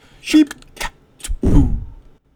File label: jingle vlog